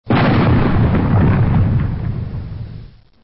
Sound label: boom